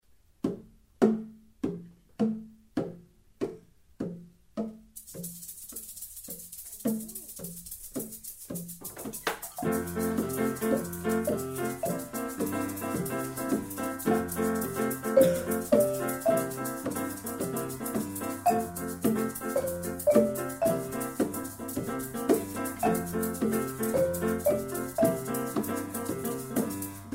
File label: samba lele